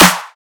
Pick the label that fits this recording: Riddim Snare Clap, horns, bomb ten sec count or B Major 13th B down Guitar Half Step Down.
Riddim Snare Clap